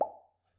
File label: plop, bubbles